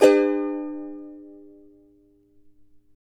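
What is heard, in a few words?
charango major, guitars; Spectrasonics; Hans Zimmer Guitars VOL-2; CHARANGO CHD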